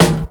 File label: fresh clean hip hop acoustic snare sample d sharp key